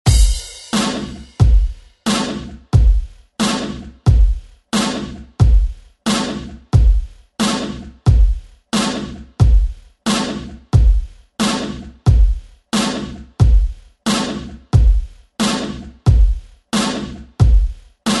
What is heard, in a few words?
Re Drum; DJ Mhark